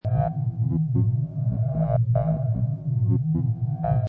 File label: Space Scifi Sound Strange Machine, Sci-fi pulsat; Sci-fi pulsating, oscillating, gyroscope or machine; Lynne Music